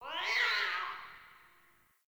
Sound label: Distant Cat Screech; Warframe; Ambience; Nineteen Ninety Nine